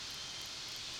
Background Noise

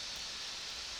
Background Noise

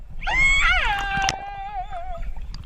call only; transient

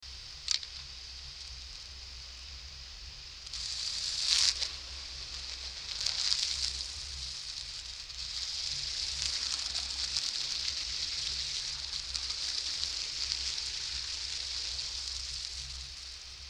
Vessel Shifting in and out of gear

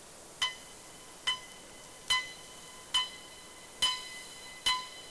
pipe

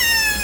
call syl, Sounds, Syllables

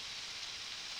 Background Noise

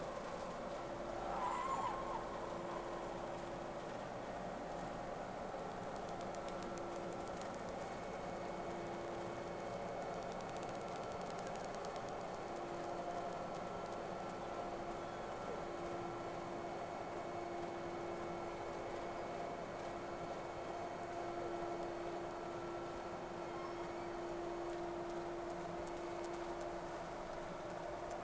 Whales; SeaSnake

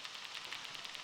Boat Noise

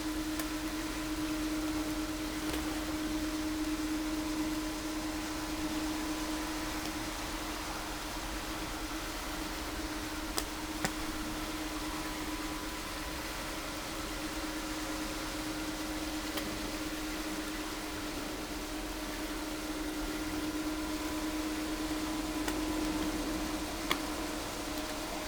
No Ship, helicopter

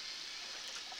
Background Noise Clips

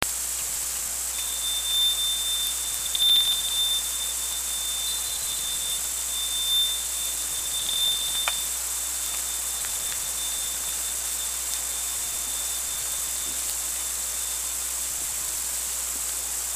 SONAR

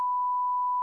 20Vp-p sound; Ishmael

